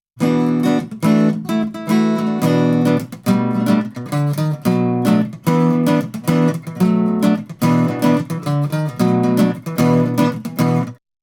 AccGtr Clean; RND Portico

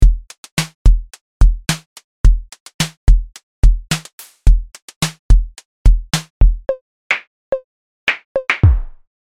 Drum; GL Eminence

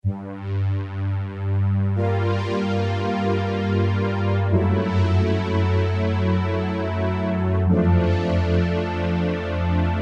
Trumpet Tune

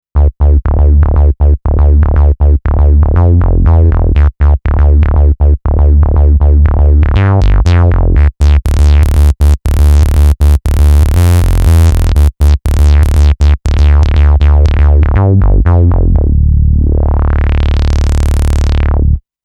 Roland AX Synth Reso Bass

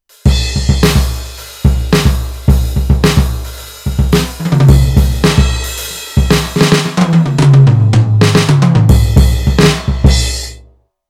Roland TD-4KP

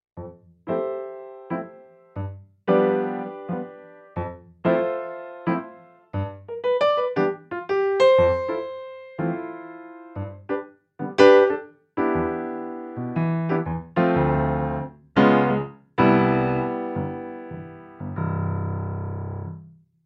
Clavia Nord Grand Piano

